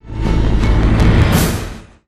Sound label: prebeat build; transformers war for cybertron gamerip